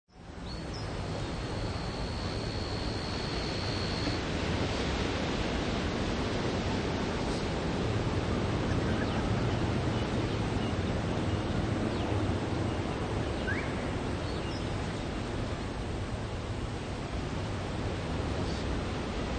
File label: Island Beach, Slow, smooth waves coming in. Some cicadas; few distant tropical birds; Environmental Sound Effects; LynneMusic